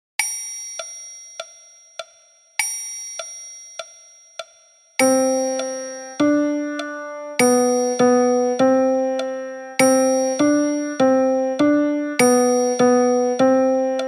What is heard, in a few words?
Piano Version